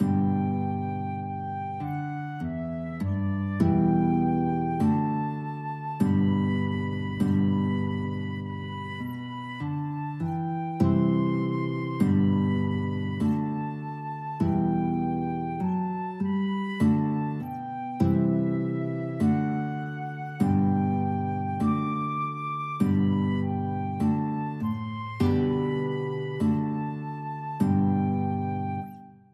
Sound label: flute guitar, guitar flute duet